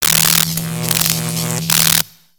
Robot Electro Arcs; Lego; ambience nexus tower

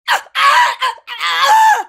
minifig shocking death

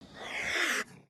baby spider attack idle; creatures mechanical

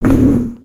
Skullken Idle; creatures organic

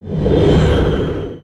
grow; Siege Tower; destructibles

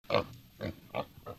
Pet Warthog Idle; creatures pets

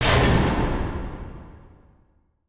piston arrive; spaceship

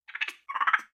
Pets Skunk Idle Short; creatures; Lego

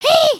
wave, emotes

